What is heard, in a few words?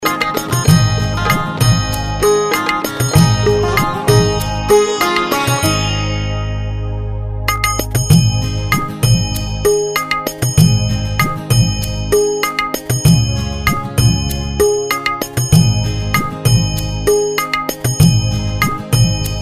Karaoke Song